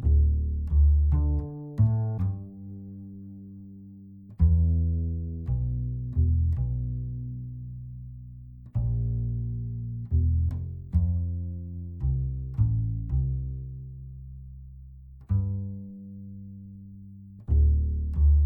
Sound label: bass; Toki Tori 2+; Toki Tori Collection